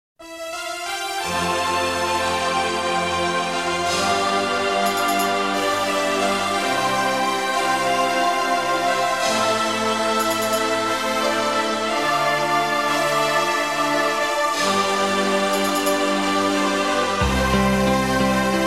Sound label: zither fantastic